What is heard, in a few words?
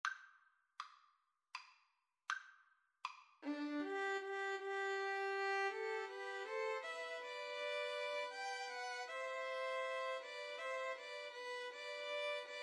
Shenandoah, 2 Violins Cello